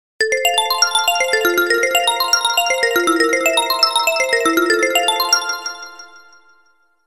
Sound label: High Arp; Hisense; A5; ringtones